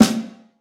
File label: verby acoustic snare sample a key, tuned snare